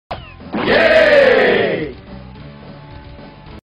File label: yeeeeeeeeeeeeeeeee; power rangers 64